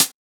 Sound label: Closed Hat; Drums; HiHats; Ghosthack Advent Calendar 2017 Day 5